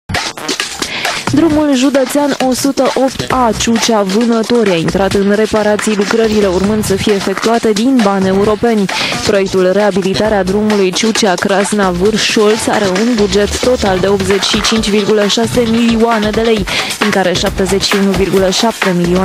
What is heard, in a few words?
drum